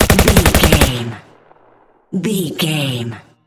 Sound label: gun semi auto rifle shot automatic